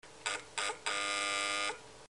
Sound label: office Buzzer